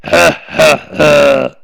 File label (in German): lacher tief, vocals